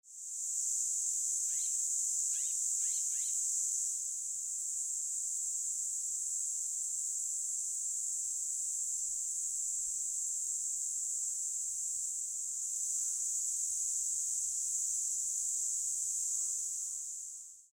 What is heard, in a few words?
Ambisonic Cicadas